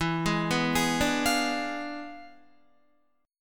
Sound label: E 7th Flat 9th, down, Guitar; E7b9; arpeggiated